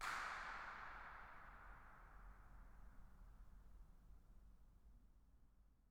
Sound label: incinerator dirac